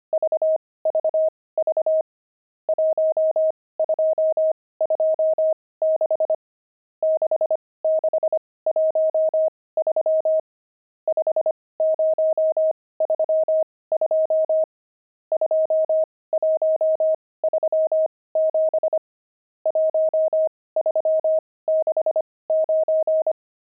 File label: pangram, intermediate pangrams files